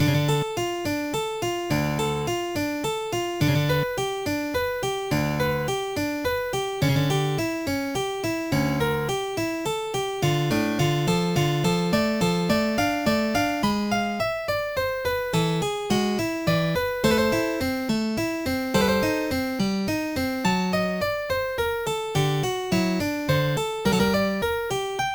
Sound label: prelude; OctaMED MED